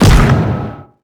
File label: biomech grab n punch